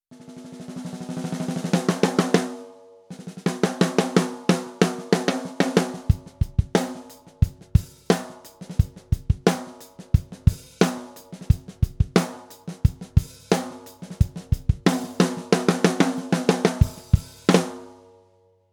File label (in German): Messing tief offen